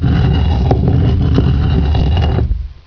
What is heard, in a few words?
tomb; svencoop